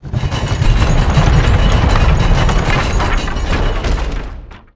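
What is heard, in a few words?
castle bridge open; objects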